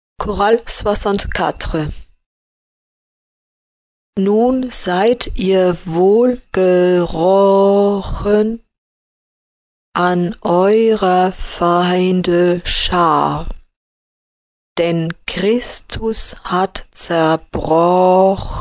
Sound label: choral; partitions chorales